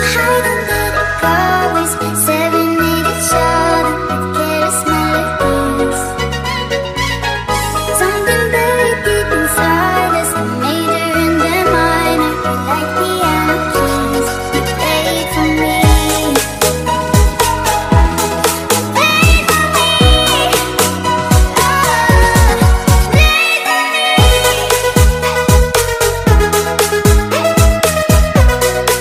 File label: english ringtone